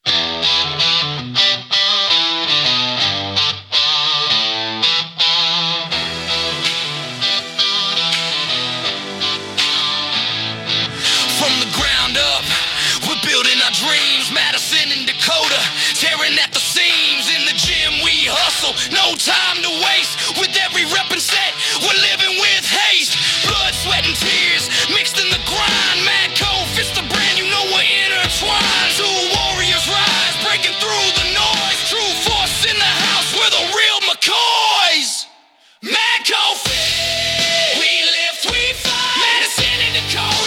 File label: Anthem angry; madco fit